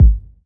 kick sample g sharp key, kick drum single shot tuned to the G# note. Loudest frequency: 79Hz; kick drum samples; one shots